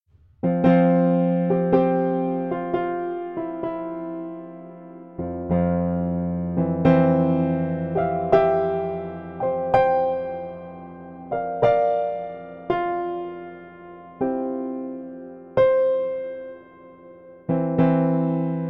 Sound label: Low Lid Low Soundboard Impedance